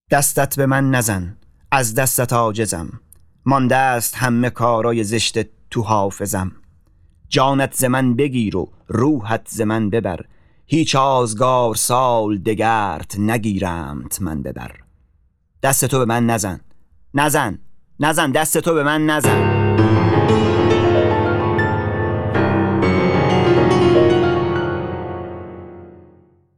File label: Short Motif